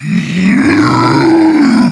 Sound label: Clot Roar